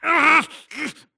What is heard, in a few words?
grunt Struggle; Titanfall 2